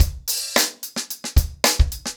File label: 110BPM, acoustic drums; The Roots Drumloops